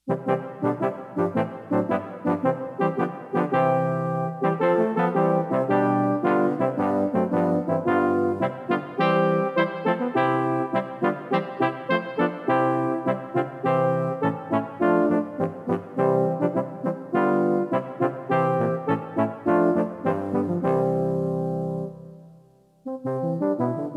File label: 4 Trombones, Christmas Carols; Vol. 5 Christmas Carols